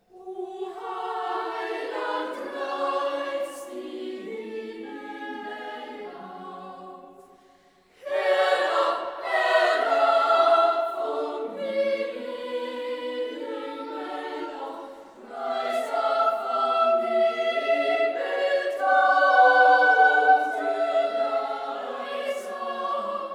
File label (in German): O Heiland reiß die Himmel auf (Arr. for Choir); Sacred Choral Music